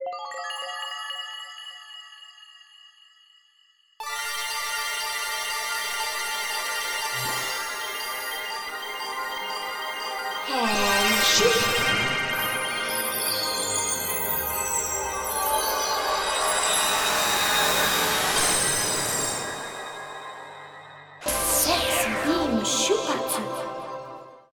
Sexy Beam Shoot

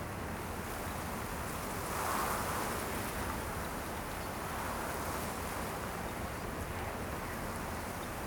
Wind Leaves Loop; weather fx; Silent Hill Downpour

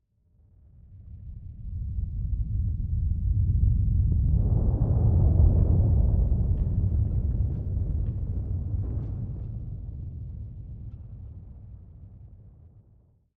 Earthquake Loop; weather fx; Silent Hill Downpour

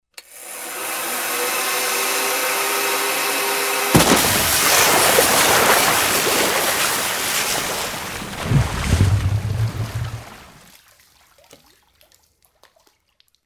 Sewer Hair Dryer

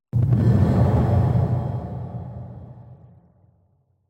Log Fall Loop; horror and atmosphere fx; Silent Hill Downpour

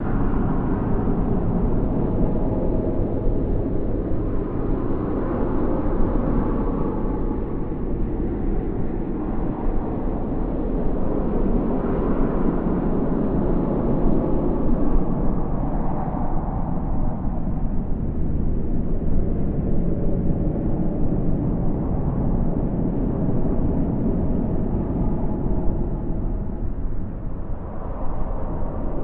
Droning; sh homecoming; by zack 1987

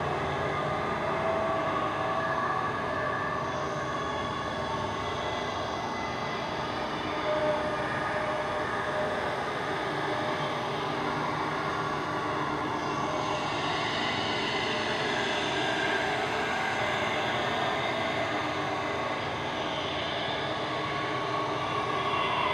Diner Amb Escape Loop; horror and atmosphere fx; Silent Hill Downpour

